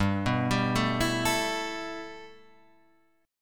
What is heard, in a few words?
G 6th Add 9th G Guitar Standard, chord; G6add9 arpeggiated; JGuitar